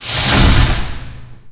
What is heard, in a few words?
tree crash; specops